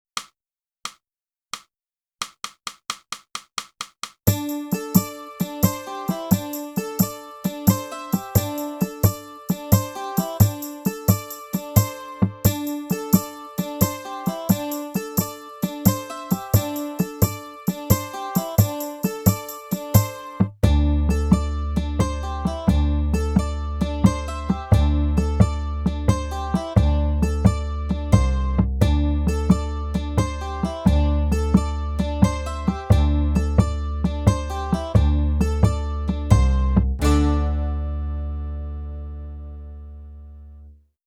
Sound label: flute; (Morgan) - Boosey Woodwind Method: Flute (Book 2) (Book & CD)